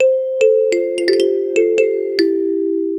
loopable ringtone cute, bamboo

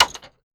combat

